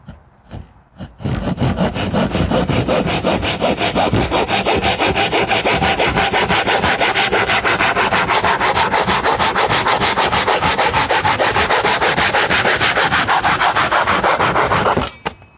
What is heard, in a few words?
hand saw